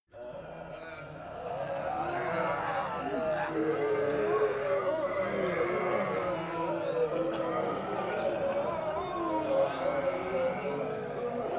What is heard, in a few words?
monster walla moan